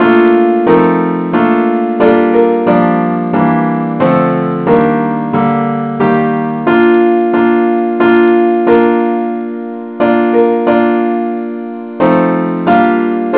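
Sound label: keyboard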